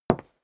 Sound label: step leather shoes wood parquet single right; schritte